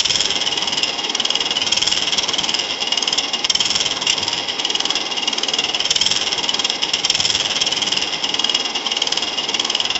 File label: machine wheel sound random; natural